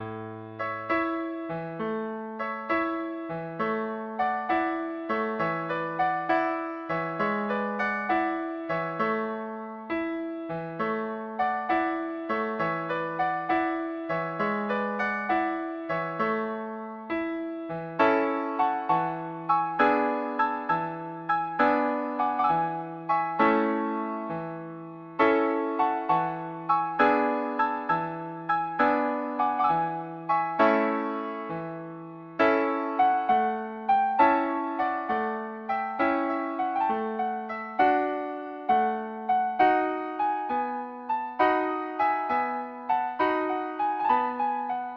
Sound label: offenbach barcarole, violin